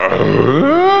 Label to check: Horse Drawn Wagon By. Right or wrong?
Wrong